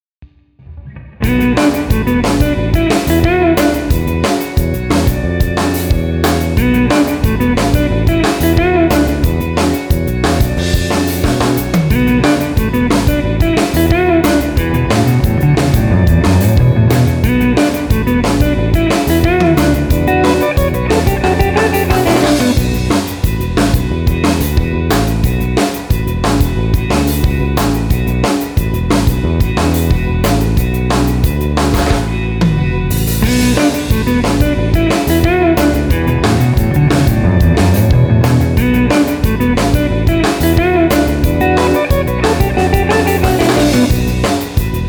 Instrumental

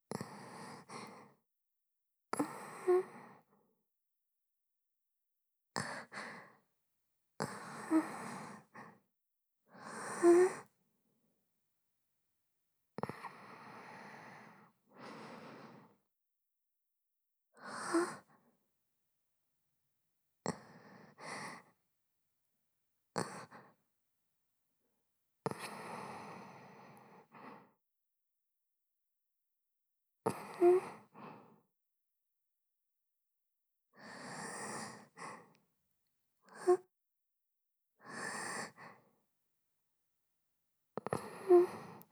Wheeze

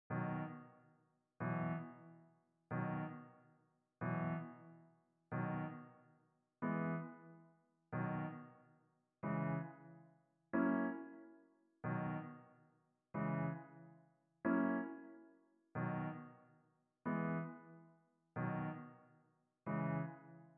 off vocal

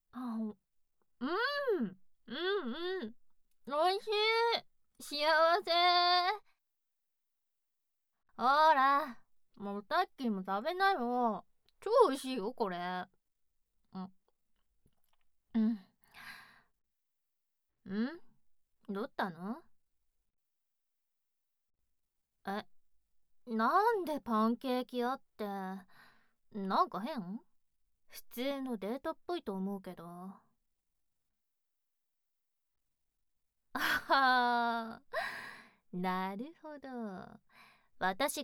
Off SE, ASMR

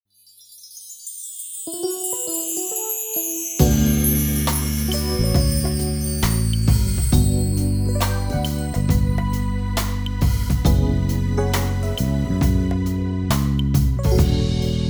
Off Vocal

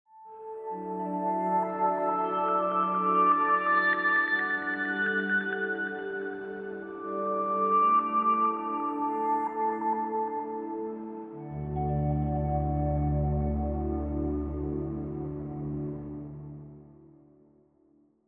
Jingle

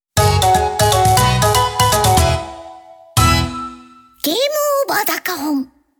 gameover, 8bit; オクターブガールズ～チップチューン箱守八千代～

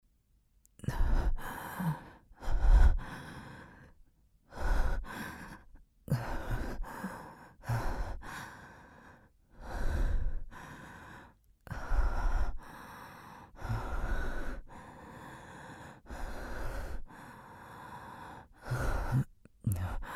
breath right, ASMR

